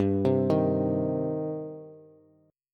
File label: G Suspended 4th Sharp 5th, Bass; arpeggiated; x,10,10,8